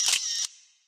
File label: camera; Source Engine; tf